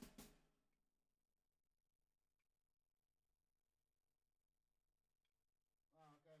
SNR B

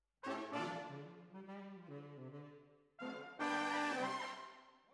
Horns

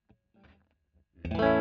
E. GTR Royer

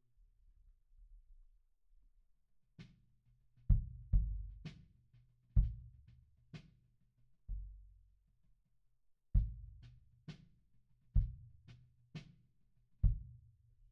Kick In, 96bpm

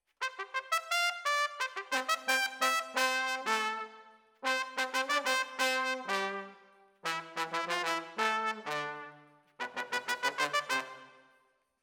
Brass Over

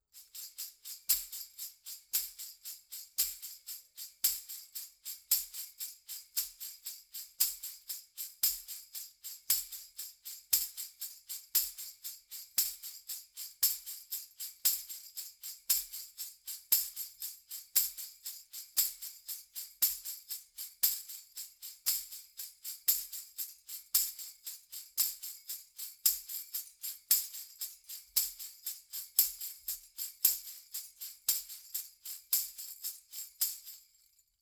PERC DYN

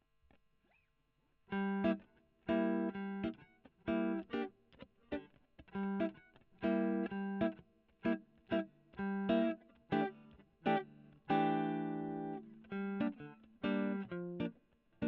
E.GTR Gefell

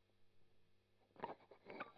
Strum Line

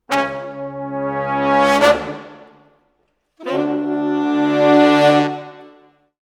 Brass, Jazz for piano

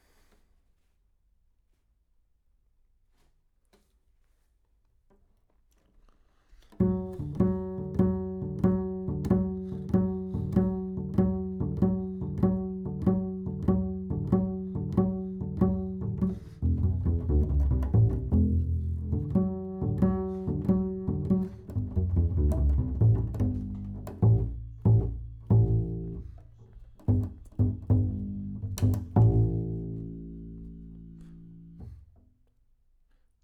Bass

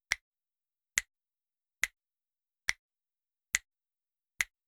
Snap

